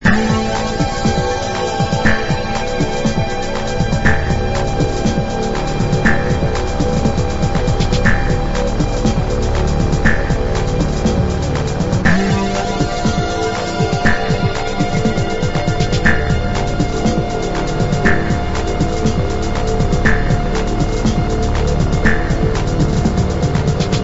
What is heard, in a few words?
ambient; House Style Paris